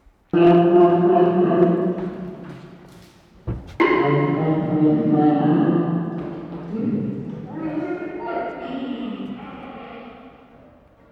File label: moaning sound premating